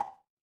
glass ceramic

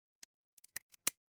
glass crack

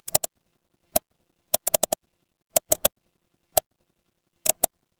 office mouse click

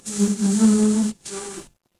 animal insect

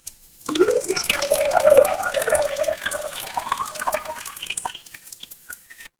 water pour

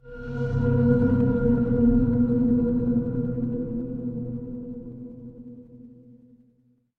horror drone

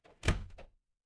door open

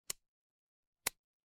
ui toggle

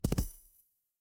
feedback celebration

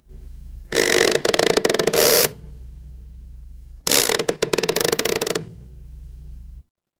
horror creak

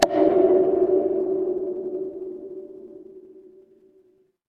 instrument wood block

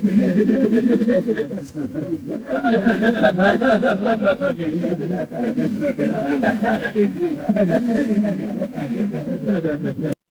crowd laughter

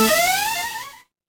retro game power up

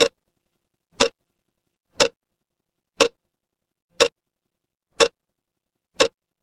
mechanical clock tick

